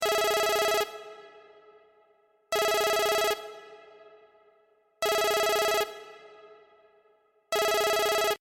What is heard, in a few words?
Phone; Doctors